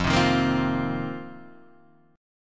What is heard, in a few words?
F 7th Suspended 4th F down Guitar Half Step Down